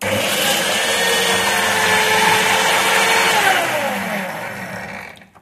juicer, machines; Paradise

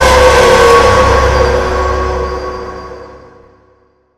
scare; CHOMPStation2; scp

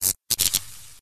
light flicker

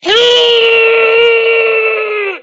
Huuu

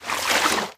slosh

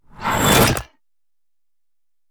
construct form; Bubberstation

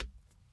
step Bare, footstep

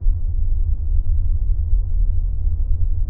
cave loop; Spookystation

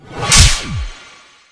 Blind, magic

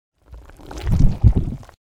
squish

